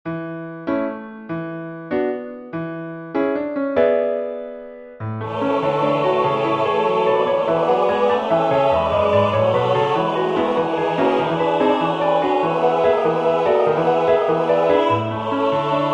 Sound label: vocalise satb pno